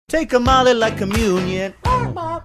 singing take molly like communion chicken clucking